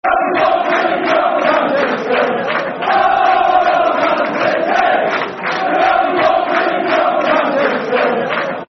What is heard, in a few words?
Football Chants (Soccer Songs); Oh Man City; Man City Mcfc